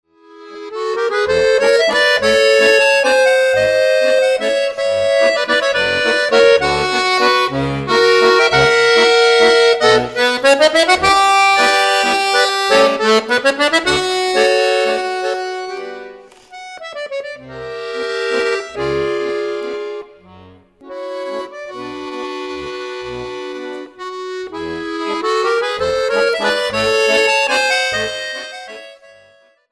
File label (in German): lyrischer walzer